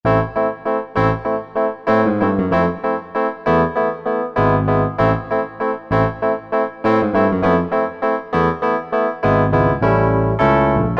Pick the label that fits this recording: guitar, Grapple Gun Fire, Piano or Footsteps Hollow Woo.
Piano